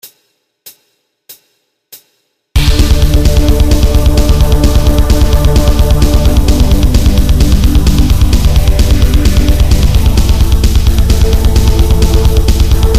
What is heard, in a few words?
Serrana Arpeggios